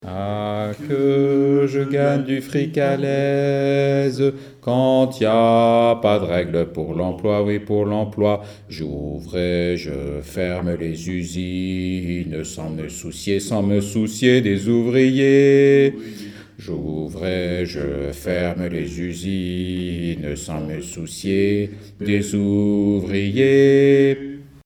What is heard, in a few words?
basses